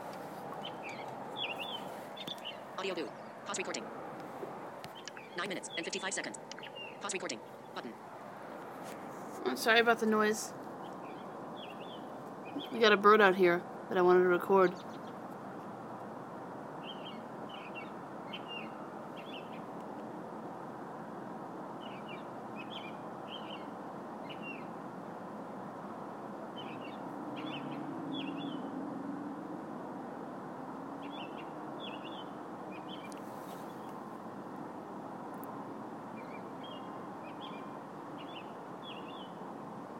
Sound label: birds alaskan spring morning